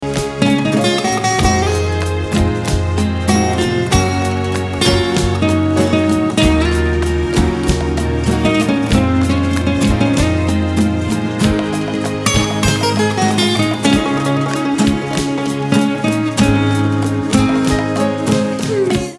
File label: Guitar